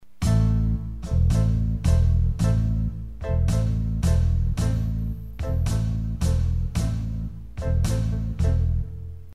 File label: habanera